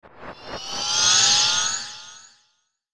Sweeper Delay Low Shimmer Ascend, Sweeper Dull Bell Ring Shimmer; Production Element Imaging Element Accent Transition